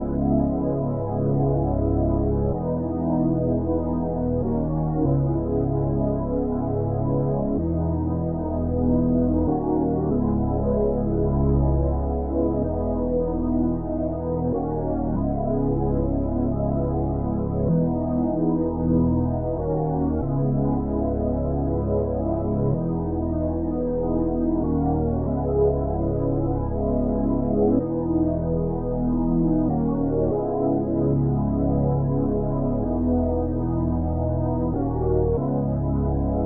95 BPM C# Min Pad, Ambient; Melody Loops; Arrival; Cymatics; ANALOG EVOLUTION Beta; Ambient Collection

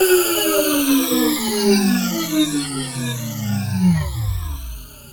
Overhanging Downlifter, Dubstep; Ghosthack - AC2024 - Day 13 - Dubstep Sounds 2025; Effects

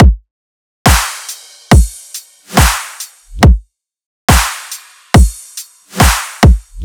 Riddim Drum Loop 140 Full; Ghosthack; Riddim Essentials Volume 2

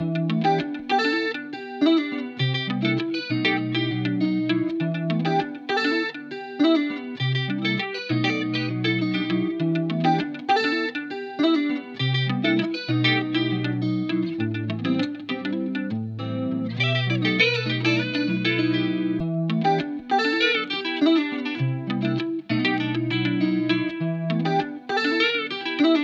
100 BPM E Min, Guitar; Water; Cymatics; ZODIAC Beta Pack

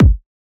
Kick, C; Drums - One Shots; Hip Hop; Cymatics - 9God Hip Hop Sample Pack

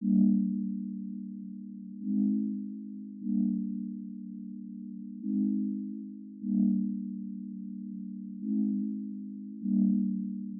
150 BPM C Min Pad, melody loop stem; Cymatics - 2022 Melody Collection - Wavy; Ganja

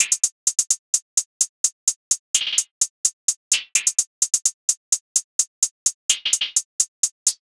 Hihat Loop - 128 BPM, Hip Hop; Barcelona

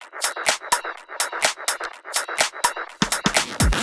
Top Loop - 125 BPM G; House; Top Drum Loops; Razor; Cymatics

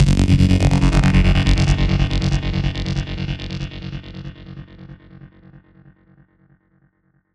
Holoscanner Zap F# 140 BPM, Psytrance; Zaps & Filter Sweeps; Ghosthack; Psytrance Visions Volume 2

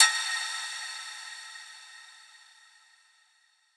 Impact; Cymatics

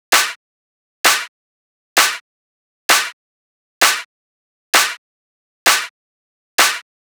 Clap Loop 130, Techno

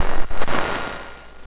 Glitch

